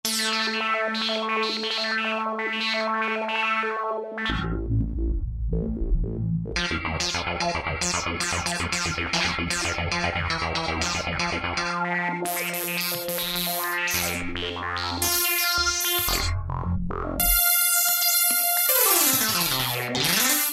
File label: Techno LFO, Roland JD-990; Factory Patches